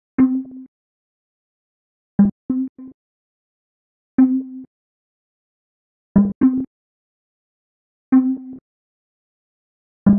salient tones congas

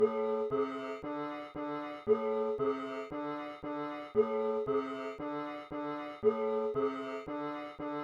Cello Sound

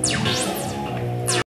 joystick

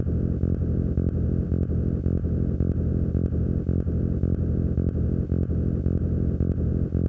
noise freeze bass

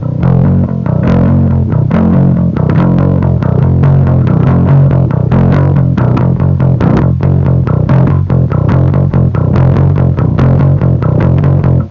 idealized baby bass guitar

